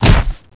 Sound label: punch